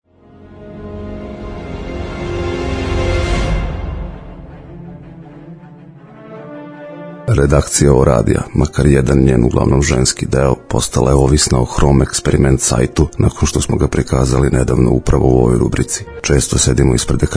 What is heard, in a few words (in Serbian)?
tehno